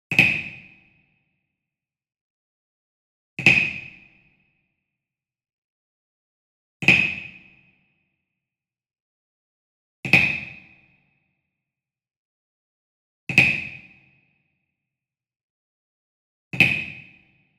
Wood Percussion Wood Block Japanese Resonant Flam Medium; boom library; Cinematic Strikes Construction Kit